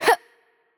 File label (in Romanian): Attack Light; bloom minigame